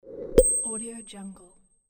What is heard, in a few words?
pop up ding